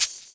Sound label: Shaker; Equipped Music; Loungin House